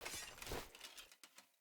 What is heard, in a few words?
Short Sword Accent; Weapons; Player